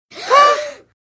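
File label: Group 5 Year Olds Gasp, cheer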